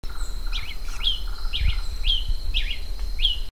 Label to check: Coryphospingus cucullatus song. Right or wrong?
Right